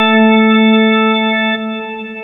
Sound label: organ; MATLAB